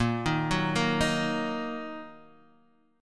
A sharp Suspended 4th Sharp 5th Guitar Half Step Down